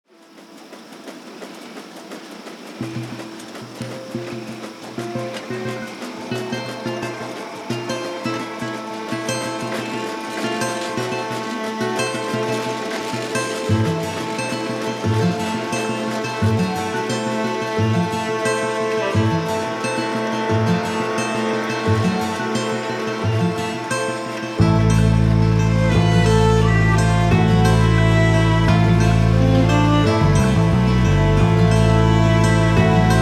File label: Violin; Final Fantasy 6